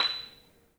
MARIMBA, B; 3D Sound; 3D Keys VOL-1

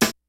FUNK, DRUMS; Kid Nepro; Elite

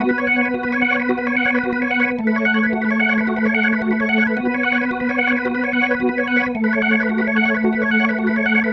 Psychedelic, Organ, Loops

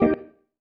Stab One Shots, Organ; Loops; Stabs; Sonic Foundry (Sony Creative Software); Joe Vitale Organ Donor

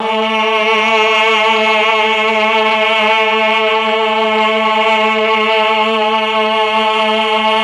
CHOR; ILIO; Propeller Island Legendary M400

